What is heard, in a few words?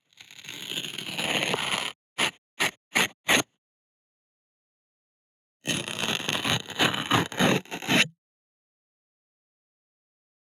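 RIPS AND TEARS Large Envelope Rips contact; Rips and Tears v.2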